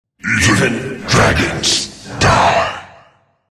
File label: jak death; jakiro